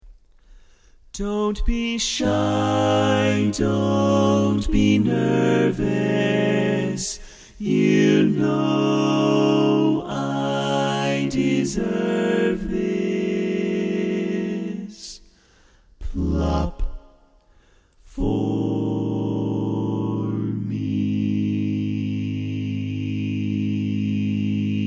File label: Tenor, barbershop tags